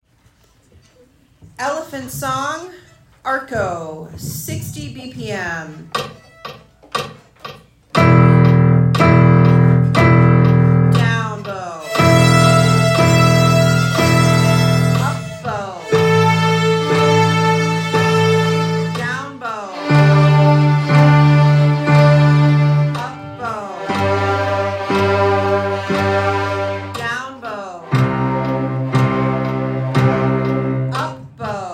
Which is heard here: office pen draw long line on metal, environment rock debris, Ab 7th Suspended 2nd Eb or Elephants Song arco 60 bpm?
Elephants Song arco 60 bpm